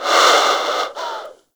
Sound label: Bird Snore; Hunting Critters; Sawgaw